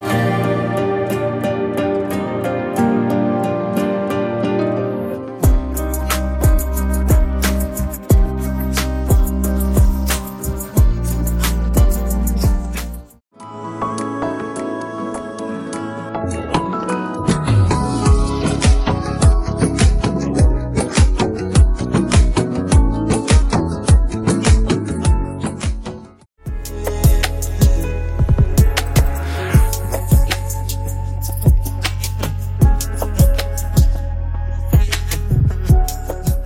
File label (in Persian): آهنگ بی کلام ایرانی